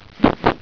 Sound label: Dig; Miner Max